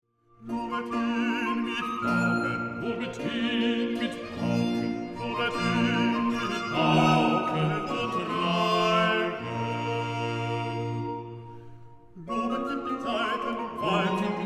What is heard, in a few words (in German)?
pauken reigen saiten pfeifen; Schutz